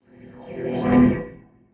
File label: teleport